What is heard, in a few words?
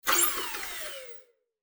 swing; tridroidznnpc